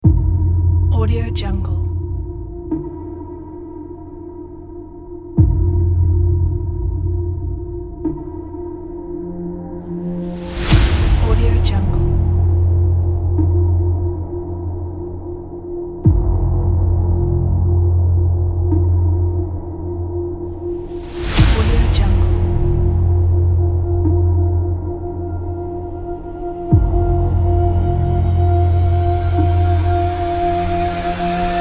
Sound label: dark hollywood sound design trailer